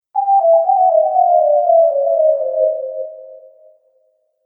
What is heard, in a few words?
Scifi Game Fail; Video Game Sound Effects